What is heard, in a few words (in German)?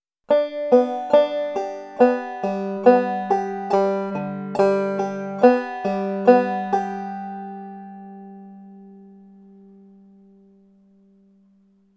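drop thumbing saitenklettern, banjo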